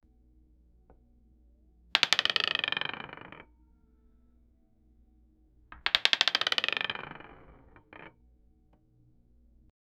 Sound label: dice